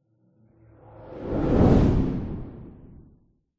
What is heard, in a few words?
science fiction space junk debris zoom by; zapsplat